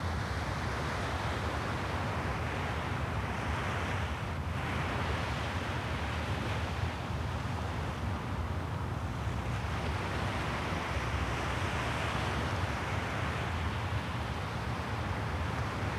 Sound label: boardwalk